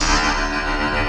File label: FX, Futurist Drum & Bass